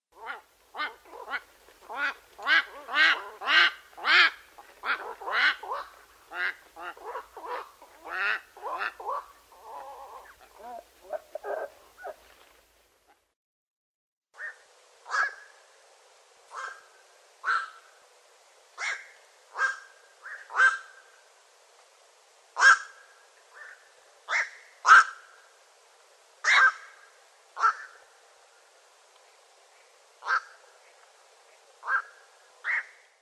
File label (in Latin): Nycticorax nycticorax; aves; Голос